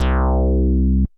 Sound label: ANALOG, BASS; Best Service; Hyper Dance